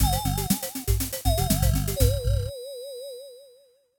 intro song; toystory; buzz

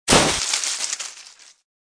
hay bale hit; Cars; tractor tip

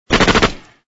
gun shot; avengers; city Under Attack

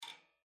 bird; starwars; episode vii the force awakens

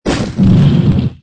Block Fall; disney; big crane game

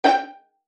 Click; conservation catapult; project green

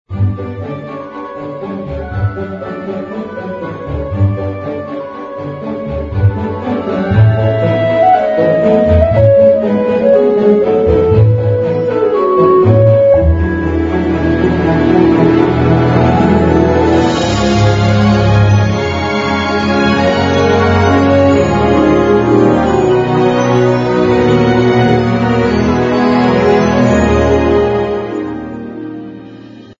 music find; the missing amulet

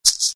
shaker

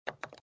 hitting wall; toystory; rex

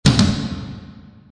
drum fill

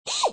Rollover; disney; big crane game

